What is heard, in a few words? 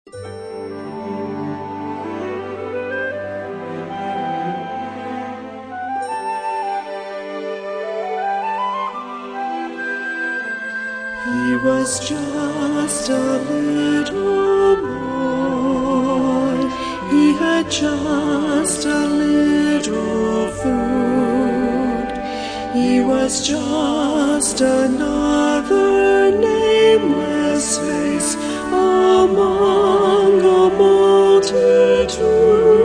Choir And Orchestra